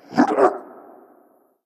hitreact human male small

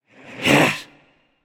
grunt hit; smite; hunbatz

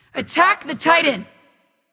vox attack; smite; mulan; voicepack

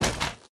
step; smite; heimdallr

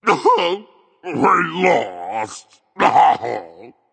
boohoo berserk; cuchulainn

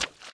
recall footstep; smite; poseidon

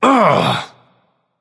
ugh; smite; heimdallr

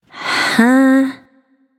confused; smite; freya

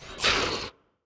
grunt; fenrir

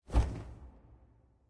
flap; smite; kukulkan

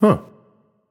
notice; Smite; baronsamedi

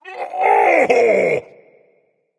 ouch, voice; Smite; ymir skin14

